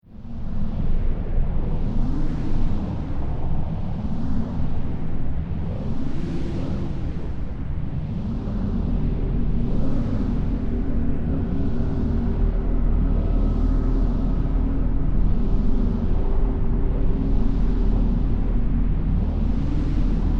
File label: Drone SciFi Alien Atmosphere Tonal Ominous Windy, Drone Scifi Alien Atmosphere Tonal Swirling Wind Rumble